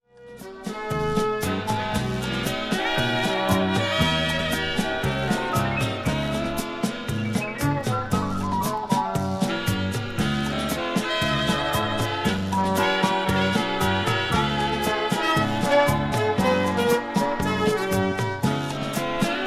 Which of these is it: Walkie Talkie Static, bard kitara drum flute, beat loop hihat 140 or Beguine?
Beguine